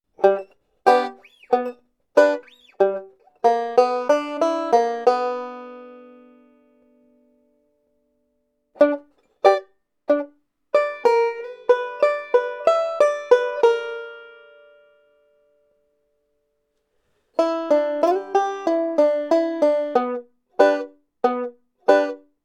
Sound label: back up licks